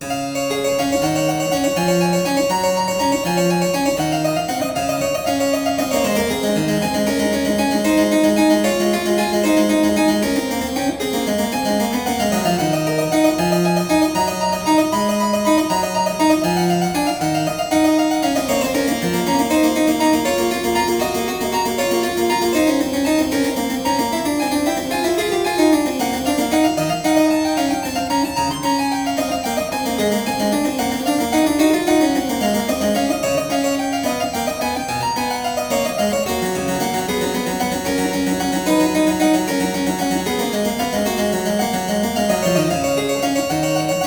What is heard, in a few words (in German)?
Gleichstufig Cis-Dur